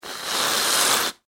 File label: Food Whip Cream Can Squirt Long; Sound Design